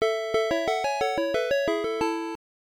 Challenging Stage Start; Namco Classic Collection Vol. 2; arcade; Namco ND-1

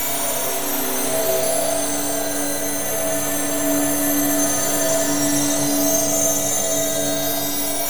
Recover hold

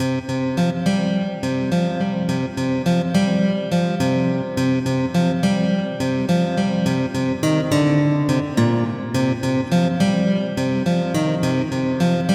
Bass

